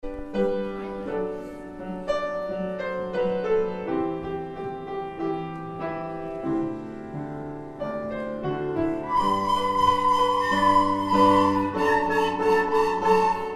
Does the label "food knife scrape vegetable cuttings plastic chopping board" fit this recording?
No